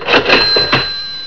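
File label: CASH REGISTER, MACHINES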